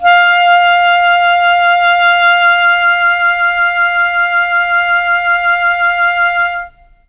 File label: F4 clarinet, mellotron